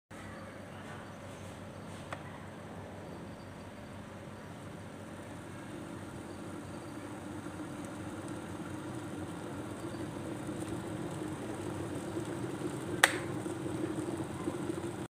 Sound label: Electric Teapot; چای ساز برقی